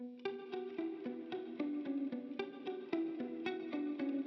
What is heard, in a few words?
Guitar Main